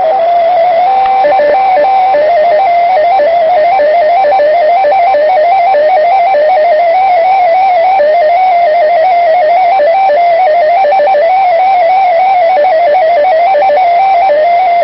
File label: coquelet